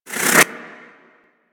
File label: Player Resurrect End